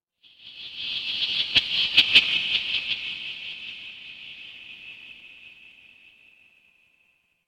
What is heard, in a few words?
horror ambience dark